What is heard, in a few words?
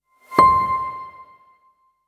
Simple, transition